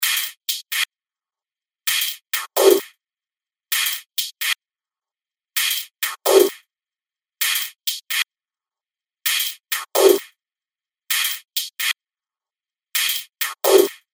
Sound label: ride cut env res env